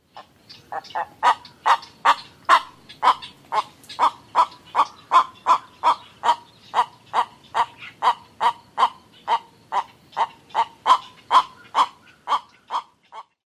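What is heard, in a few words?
Black-crowned Night-Heron alarm calls at rookery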